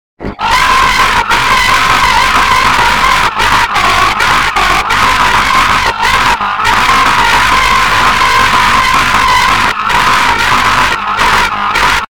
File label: scream distorted; mario